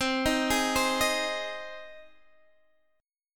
C Minor Sharp 5th, Guitar, DADGAD; down; chord shape

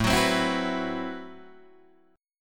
A Minor 6th Add 9th, Guitar Open D

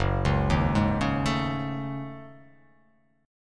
F sharp Minor 6th Add 9th

